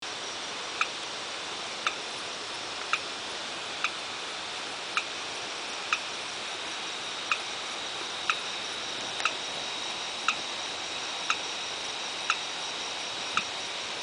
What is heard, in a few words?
Aplastodiscus arildae